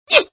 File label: Fem Pain